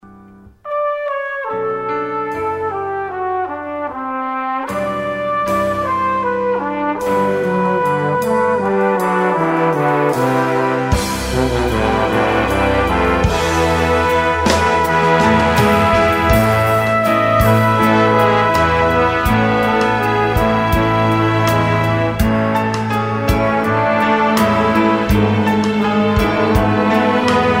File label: shout, brass